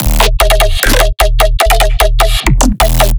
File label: bass loop 150 D#; Virtual Riot